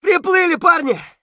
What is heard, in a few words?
hurt near death; Escape from Tarkov; scav3